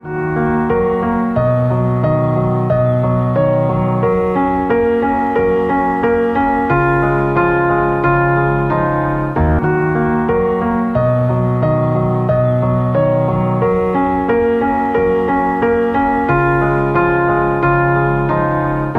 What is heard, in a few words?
Vivo T2 Ringtone